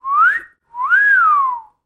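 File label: sounds of cartoon whistle at the sight of a beauty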